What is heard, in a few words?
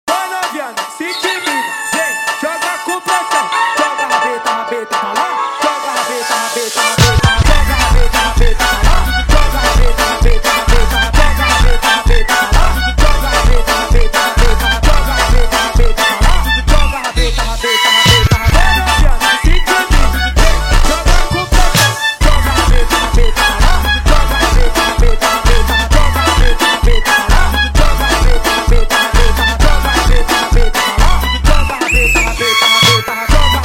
Funk